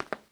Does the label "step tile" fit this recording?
Yes